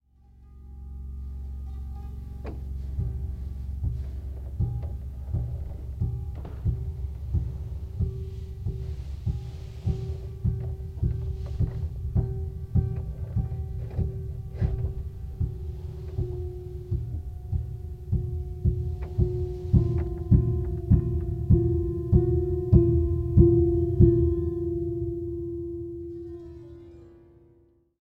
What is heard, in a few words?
Piano Hammer; Among the Sleep